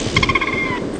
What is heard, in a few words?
dive sound